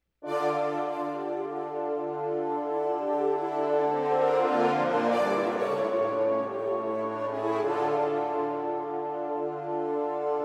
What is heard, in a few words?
Horn thing